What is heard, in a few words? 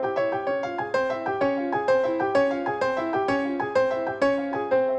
Latin Lounge Piano